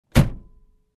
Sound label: Car Door Close